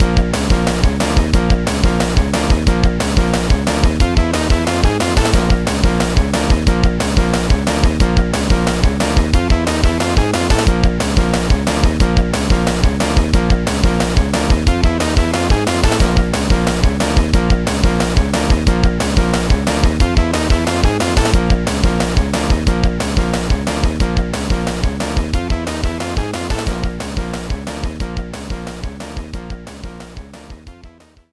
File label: BGM minigame boss